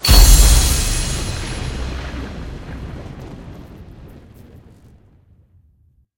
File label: big Win Hit; riches of midgard